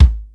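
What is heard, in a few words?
bass drum hi; 300 Drum Machines; Yamaha Motif ES-Series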